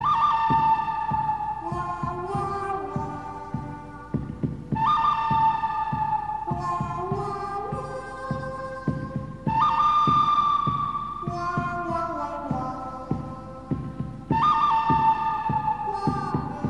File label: western